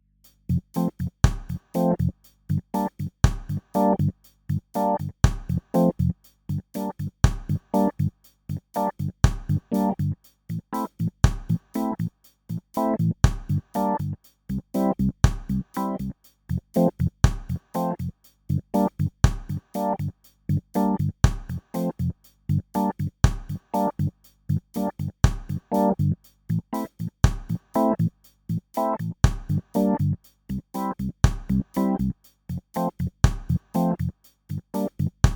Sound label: reggae